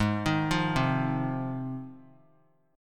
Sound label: G sharp 6th G sharp down, chord